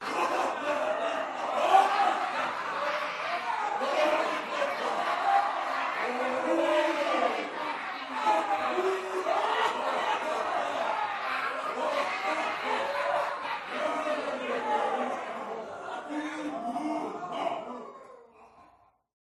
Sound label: small group of 20 zombies yelling and laughing loudly long; Sound Ex Machina